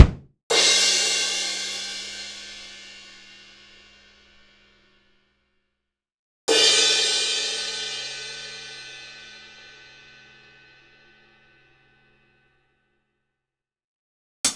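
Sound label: Akai MPC500 Ambient Room Kit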